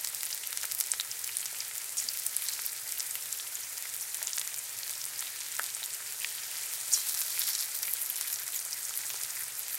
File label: Fry Pan; MiSide